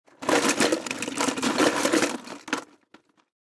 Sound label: toy box gasyagasya; anemoi